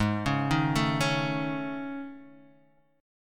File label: A Major 7th Flat 5th G sharp